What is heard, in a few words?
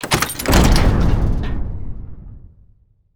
door open